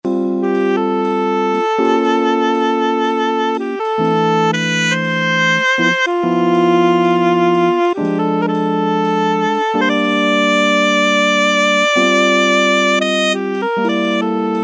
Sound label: Melody 2 Layer